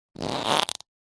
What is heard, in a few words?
human fart forced end; zapsplat